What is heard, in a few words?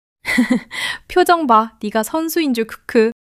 laughter